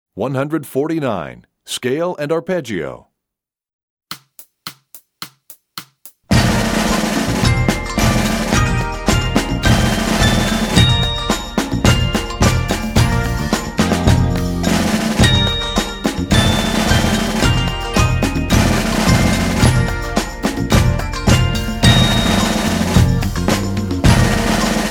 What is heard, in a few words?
Scale And Arpeggio, Percussion; EE2000 Book1